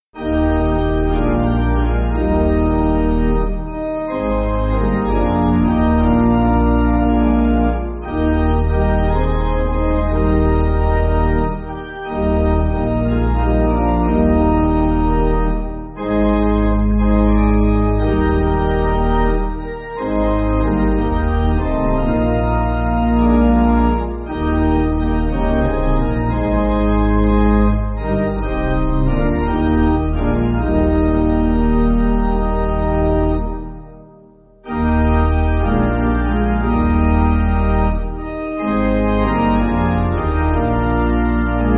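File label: Pipe Organ, church backing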